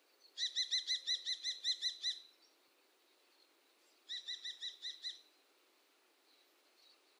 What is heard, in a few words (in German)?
Turmfalke Falco tinnunculus